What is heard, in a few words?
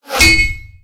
tank hit; War Thunder; sfx tanks